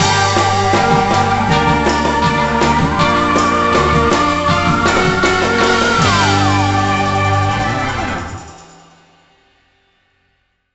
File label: Music Spirit Drums Outro; monster lab; nds